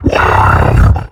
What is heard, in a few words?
biomech vce hurt